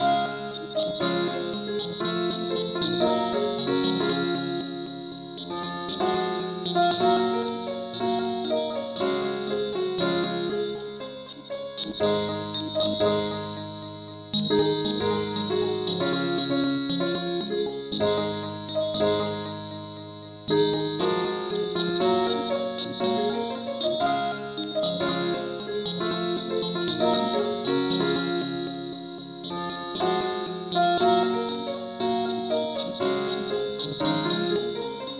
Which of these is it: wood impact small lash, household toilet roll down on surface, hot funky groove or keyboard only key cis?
keyboard only key cis